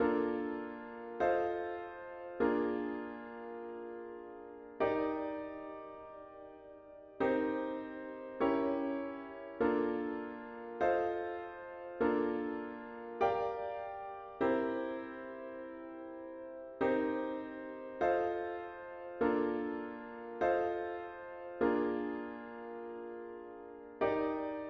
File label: chords only